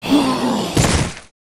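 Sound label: Npc Skeleton Sword die; FlyForInsanity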